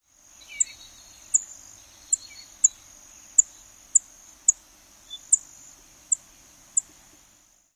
calls

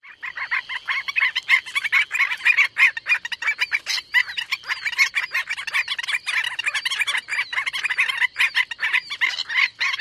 courting group

